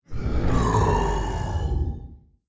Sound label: boo death